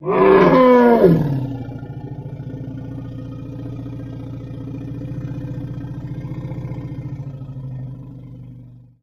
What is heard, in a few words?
Elephant Trumpet